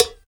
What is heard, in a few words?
cowbell